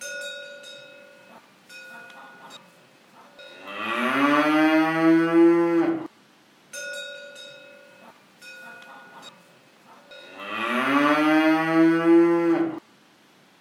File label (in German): Kuh